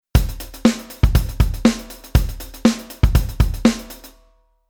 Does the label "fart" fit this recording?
No